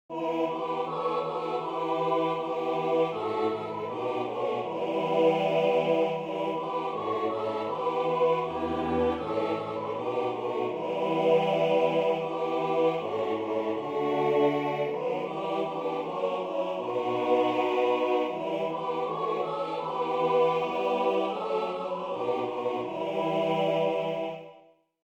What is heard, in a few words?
soprano; Sing We Now of Christmas